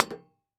Foley Footstep High Heels Single Step On Metal Platform; Foley Footsteps